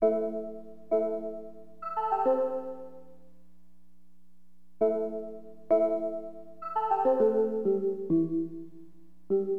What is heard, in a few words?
BELLS, Keyboards; LiveAct Pool; AcidJazz Funk